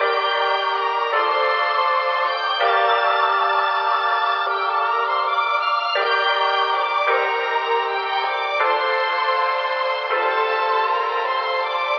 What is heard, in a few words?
strings; Phonky